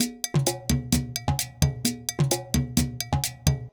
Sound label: Loop Percussion 130